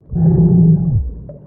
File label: Hiss; Barotraumatic; Razzershrimp